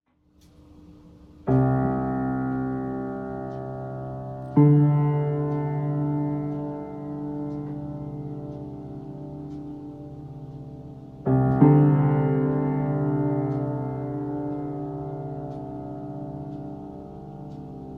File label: 2 note impro